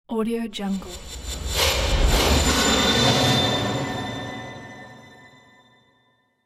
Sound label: diffuse swoosh